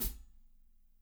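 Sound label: closed hi-hat, NATURAL KIT; Spectrasonics; Burning Grooves CD2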